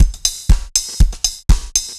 dancefloor tight 120

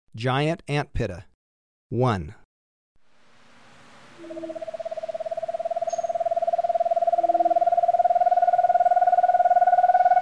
Giant Antpitta; S. America

